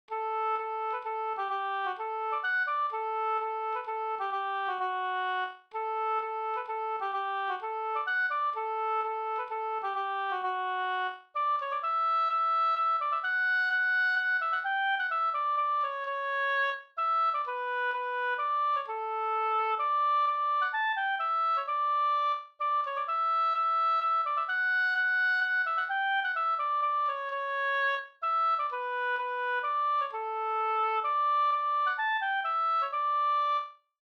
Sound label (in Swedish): polska